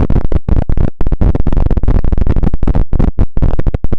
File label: rocket; jet racing; engine